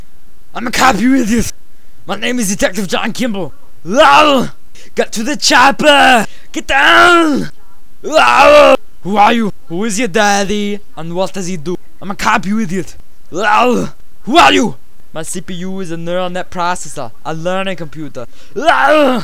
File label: RAWR